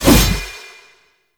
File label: Spear Gun Throw Fire; Hoplite